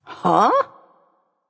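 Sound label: confused, female; smite; voicepack; hera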